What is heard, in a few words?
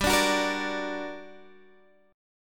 G Minor Major 7th G, Guitar Open G; chordshape; down